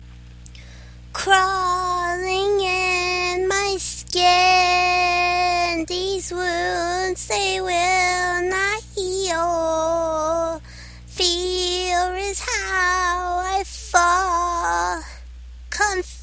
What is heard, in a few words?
fart buckets